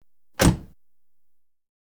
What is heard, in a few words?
Audience Applause; Office sounds; Valentino Production Sound Effects Library CD50